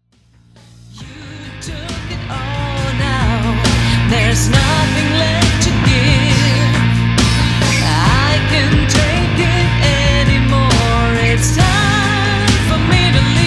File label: GAME OVER short